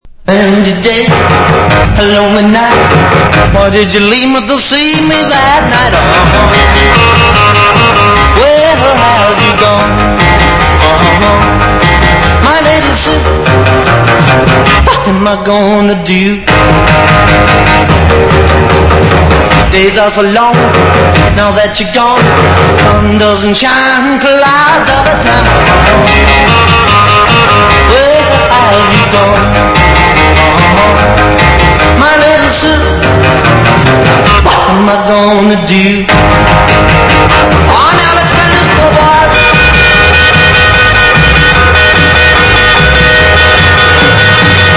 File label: rockabilly rock